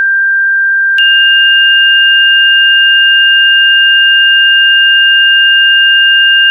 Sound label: Chord